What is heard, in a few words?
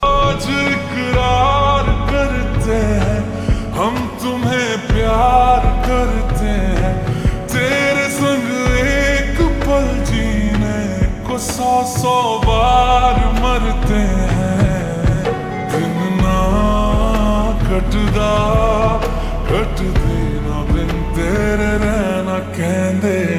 Ringtone Slowed Reverb